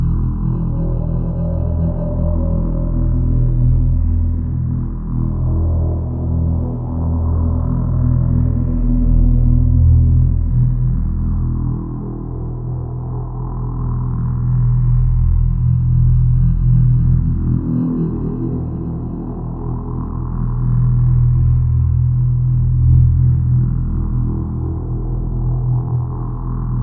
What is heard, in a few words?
Drone; Discovering Space 2 VR